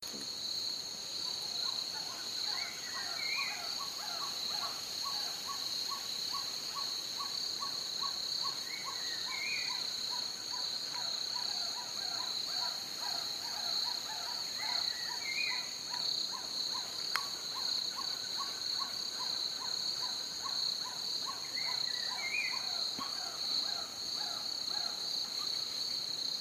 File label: Black tailed trogon